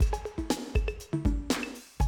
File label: 120, Classic Drum Loops